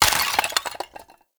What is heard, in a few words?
Vase Clay Small; Objects; Vases